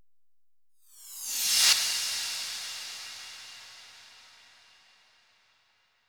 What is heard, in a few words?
139BPM Short FX; Vengeance Samples; Brandmark Kit; Sound FX